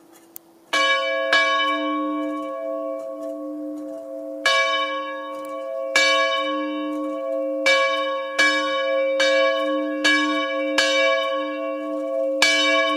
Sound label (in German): Glocken